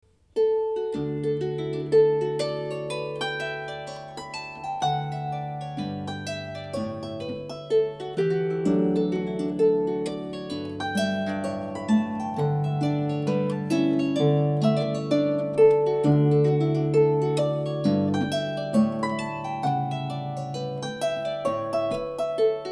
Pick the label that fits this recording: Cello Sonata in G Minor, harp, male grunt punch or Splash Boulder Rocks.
harp